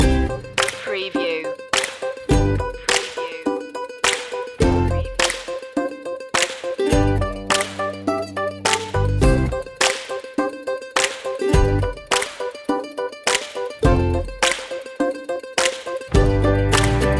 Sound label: so happy loop